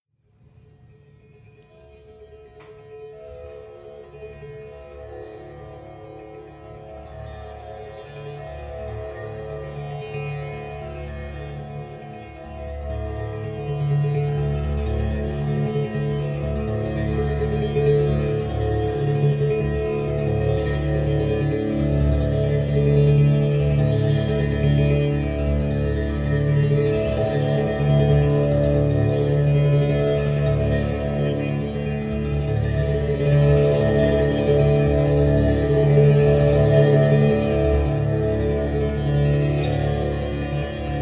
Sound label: monolina monochord melody d; loops